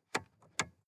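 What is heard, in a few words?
sports car interior lightswitch double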